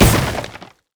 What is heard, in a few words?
frost flamebreath; LoL; shyvana